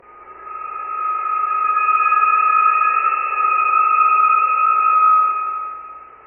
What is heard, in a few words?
dissonant horror strings